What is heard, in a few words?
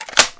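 m16 magazine, weapons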